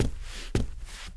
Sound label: statue walk; Seer; GamigoNA